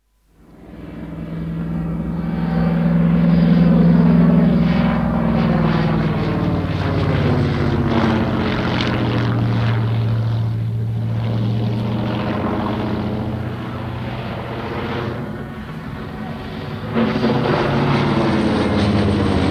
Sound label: aircraft; bbc